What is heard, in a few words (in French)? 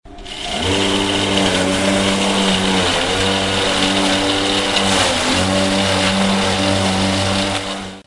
Tondeuse gazon; bruitage; Electro-menager